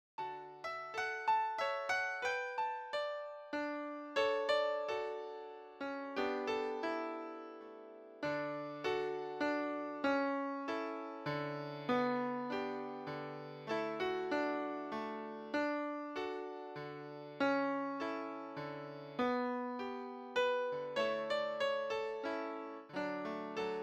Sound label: All Bells in Paradise Accompaniment